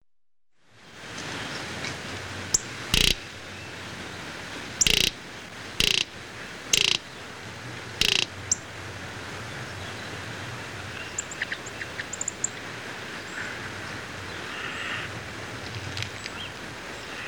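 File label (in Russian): калифорнийская земляная бегающая кукушка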